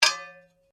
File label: Blade Heavy Moving Impact Ring, Sword; Foley sounds for melee weapons; Swords (Sound Effects:Combat)